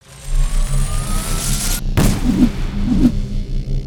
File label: Charge full